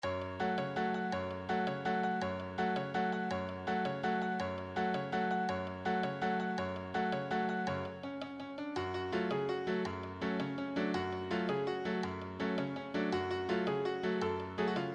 solo, piano

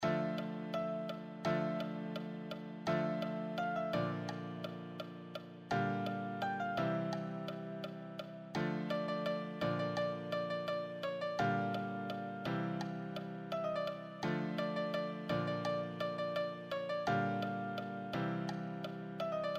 easy solo

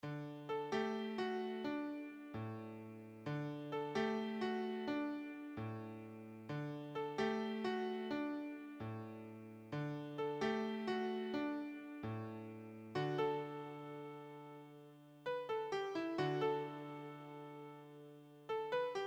beginner solo, piano

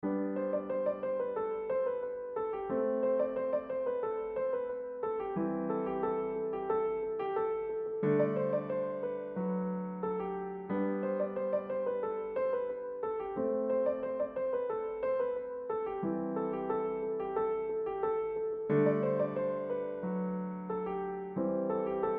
Piano Solo; Oh My Kadavule

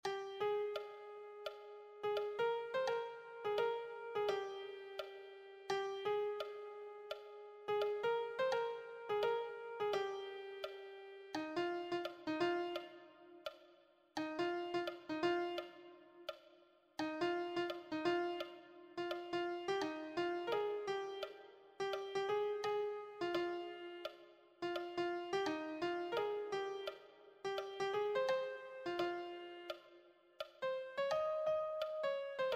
melody

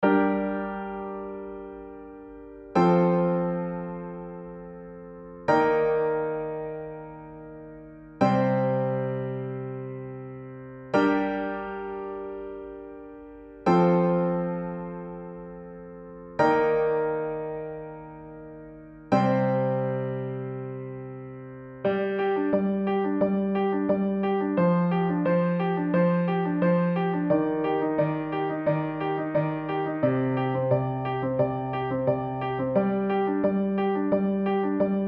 Train Station BGM solo

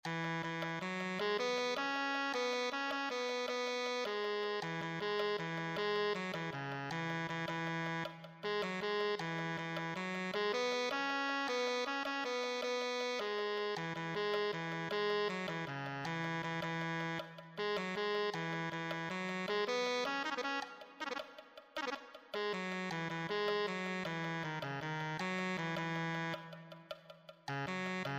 tenor saxophone

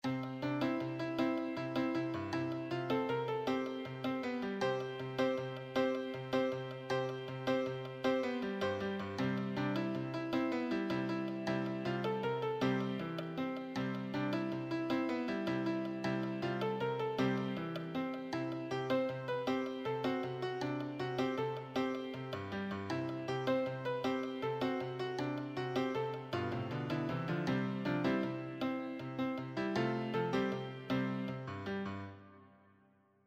advanced solo, piano

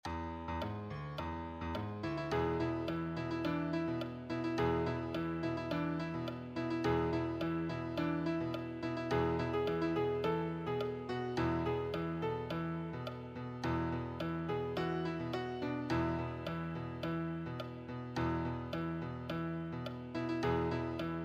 solo, piano solo

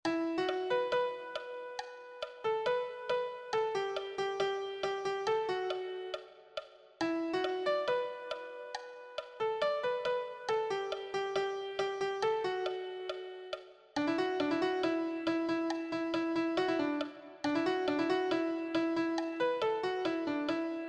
lead, piano

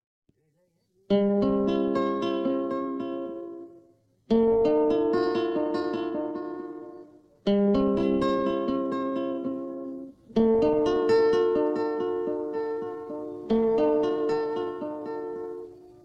backing track

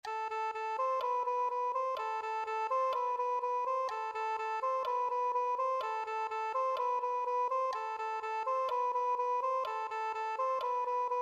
soprano saxophone

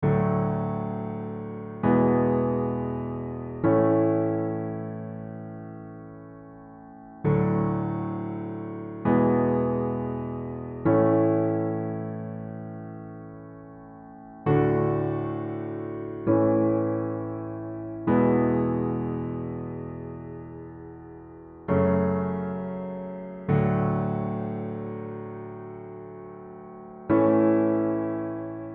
solo, piano

